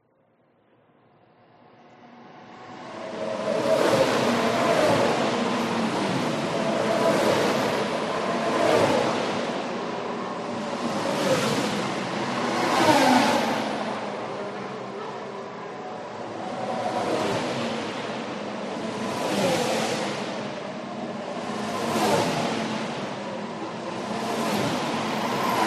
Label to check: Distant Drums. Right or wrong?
Wrong